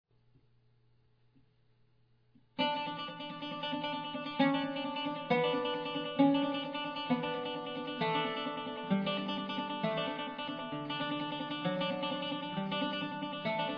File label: slow tremolo, oud